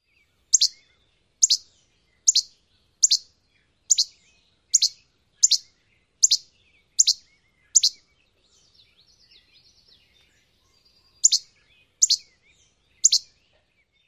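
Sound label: Least Flycatcher songs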